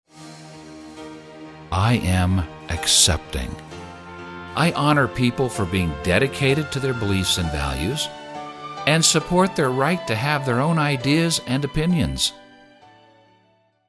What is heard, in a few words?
Accepting music